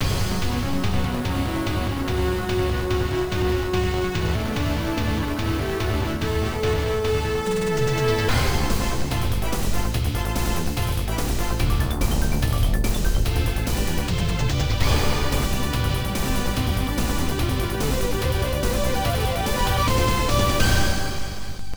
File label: TV Broadcast; Run and Gun